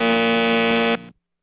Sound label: Intercom Buzzer; buzz & hums